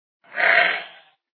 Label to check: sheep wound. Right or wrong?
Right